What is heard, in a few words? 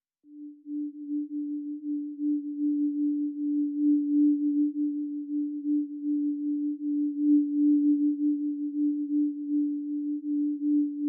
Pads Only